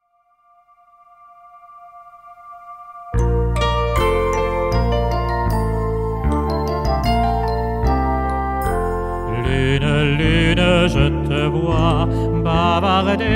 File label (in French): Pour la lune - 2ème voix seule; Ecoles Qui Chantent 1999-2000